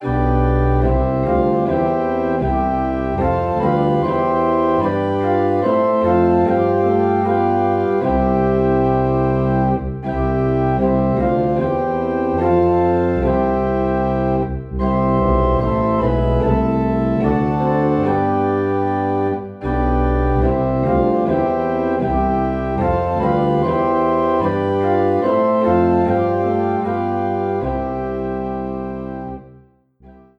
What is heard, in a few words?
Organ in C; A Charge to Keep I Have